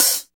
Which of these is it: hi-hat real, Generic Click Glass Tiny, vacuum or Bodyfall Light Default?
hi-hat real